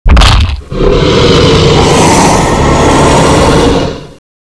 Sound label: bite door; renesaur